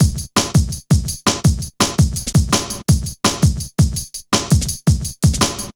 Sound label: Hard Stepz B, Drum Loops 166.5; Zero-G Jungle Warfare 1, 2 & 3